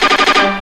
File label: HIT RAGGA; AMG - Now CD-ROM (Roland); B.2.B. Fun